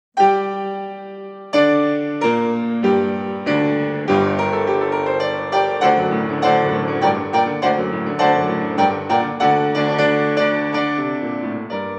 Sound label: Polonaise No 15 in G minor piano